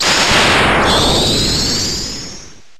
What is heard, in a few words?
Charge Beam